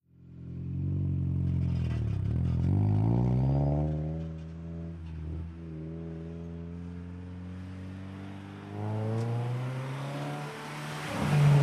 Integra, series of decelerate & accelerate circle approaches, Acura Integra